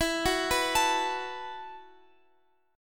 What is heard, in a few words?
E Suspended 2nd Suspended 4th E, down, Guitar, Open F; Esus2sus4; arpeggiated